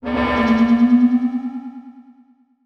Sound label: Transition Delay Medium